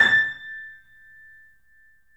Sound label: A -R, The Piano; InVision Interactive - The Piano